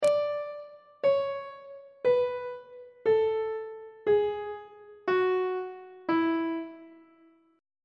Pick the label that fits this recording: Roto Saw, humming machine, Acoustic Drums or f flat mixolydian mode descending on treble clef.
f flat mixolydian mode descending on treble clef